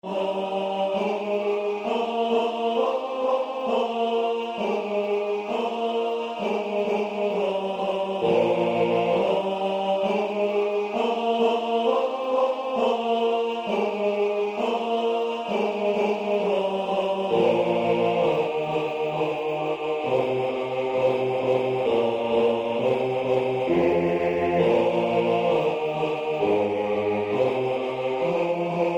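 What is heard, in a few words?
Ubi caritas bass; Durufle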